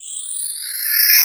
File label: FX REVERSE